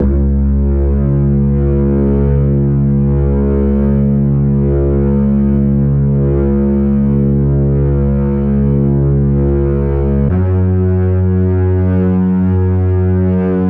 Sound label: bass